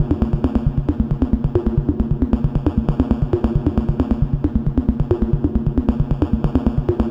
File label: Percussive